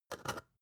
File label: spice jar scratch close short